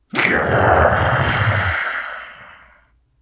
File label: pain, voice; garrysmod